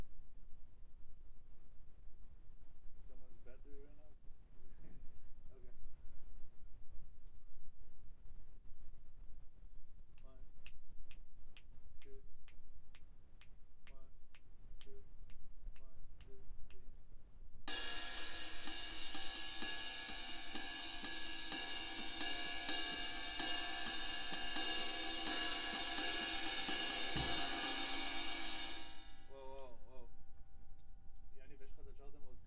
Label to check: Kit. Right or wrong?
Right